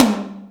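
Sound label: Hi Media, Alesis D4 drum machine tom; 300 Drum Machines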